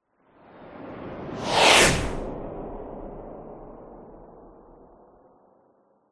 science fiction space fighter fly past very fast whizz by